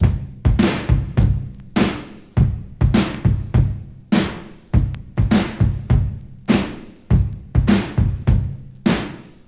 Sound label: 93 bpm drum beat e key, Free drum loop - kick tuned to the E note. Loudest frequency: 651Hz